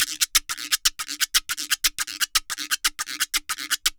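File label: GIRO SALSA, salsa drums and percussion; Best Service ProSamples vol.14; AKAI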